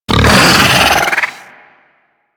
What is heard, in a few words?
Pet Vocal Angry; Enemies; Vocals; Warframe; Alad V Pet